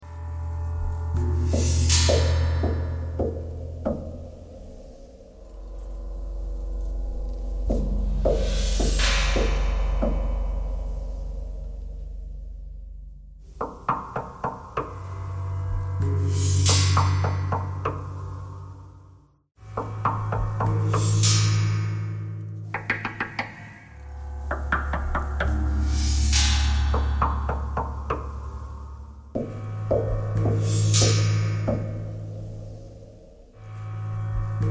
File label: knocking thread noise